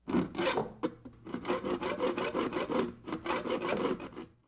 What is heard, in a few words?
saw, SHIPYARD; AMBIENT; Pirates Of The Caribbean